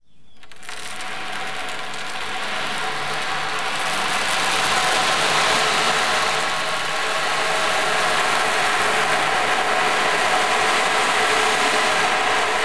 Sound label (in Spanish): Oceanico tejido piel reverb